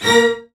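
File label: String Section; CMI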